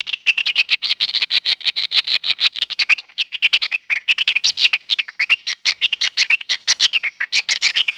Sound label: excited small alien creature chirps; birds